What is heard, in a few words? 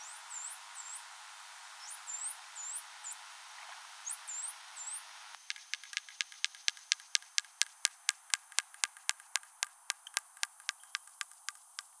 multisound white beaked dolphin